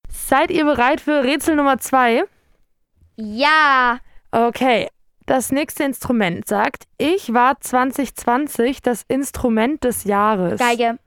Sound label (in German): Musikrätsel Geige